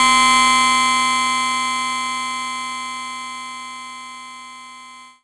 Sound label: Noise